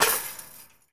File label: Street Ball Chain Swish; SFX Kit; Street Basketball